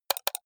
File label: computer mouse left click double hard